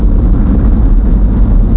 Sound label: hum; The Elder Scrolls III Morrowind GOTY; XBOX